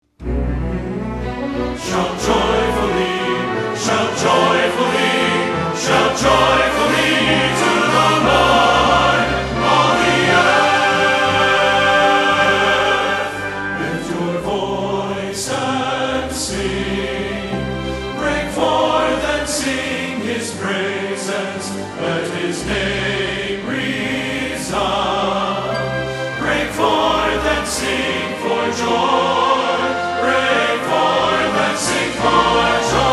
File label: Choir And Orchestra